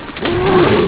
laser gun

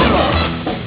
laser gun

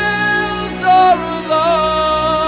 female laugh

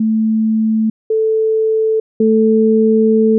octave 220 440

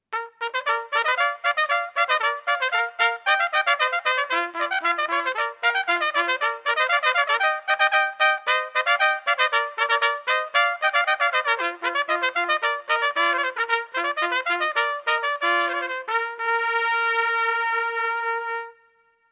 fanfare and duo; charpentier

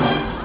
close door

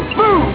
car horn

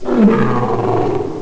roar

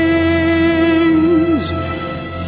female laugh

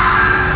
car horn

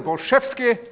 sample, male laugh

